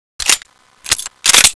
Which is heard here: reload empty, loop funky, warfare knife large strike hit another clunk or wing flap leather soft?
reload empty